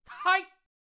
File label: Taekwondo Attack Vocals